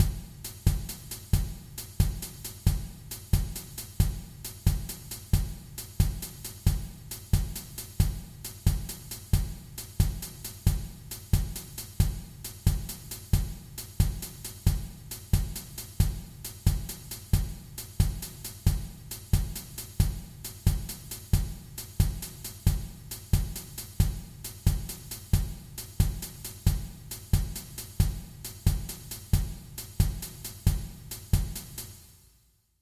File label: Triplet drum track